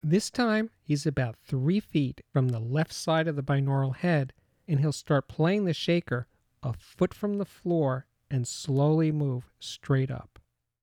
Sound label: Left Channel Shaker Announcement; The Ultimate Headphone Demonstration Disc ( 24Bit192kHz ); Chesky Record